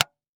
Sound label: Technology Electronic Portable DVD Player DVD Drive Closing